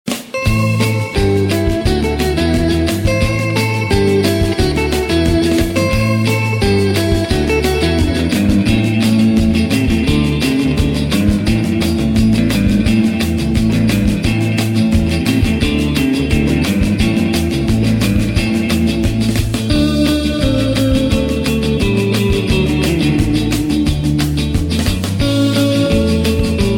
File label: Guitar; Guitar Mania Vol.11